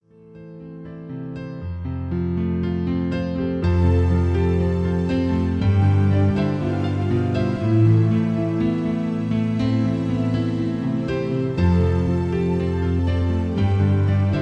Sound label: Piano Version